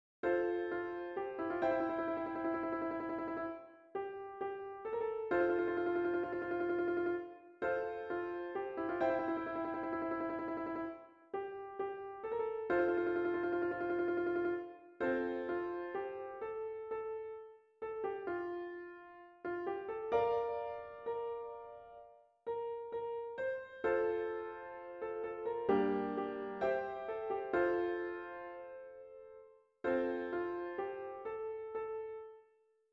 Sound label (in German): akkordeon